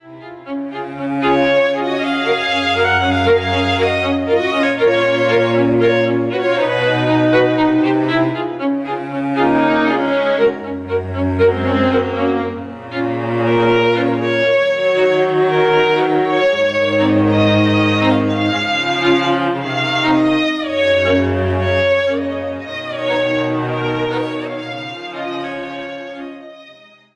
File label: String Quartet